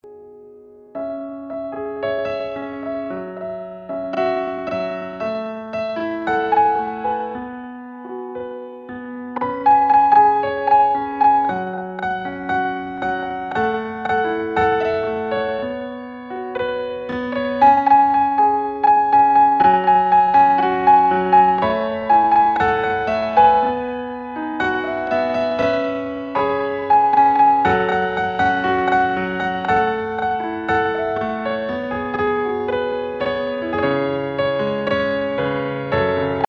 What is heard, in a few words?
piano cover